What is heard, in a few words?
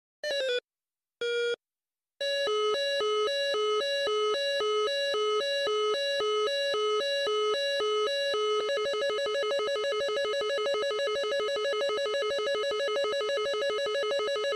f18 lock on ringtone